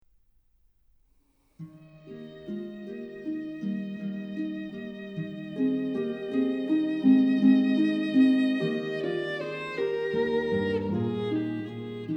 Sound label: Sonata for Violin and Keyboard; Adagio; J.S Bach; 10. Adagio